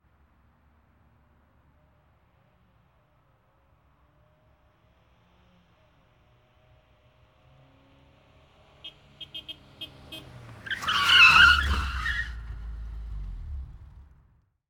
scharfe bremsung; geraeusche digital

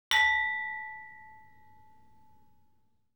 anstossen mit weinglaessern; geraeusche digital

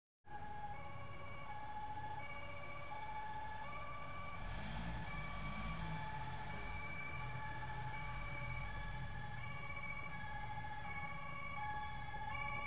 naeherkommendes martinshorn; geraeusche digital

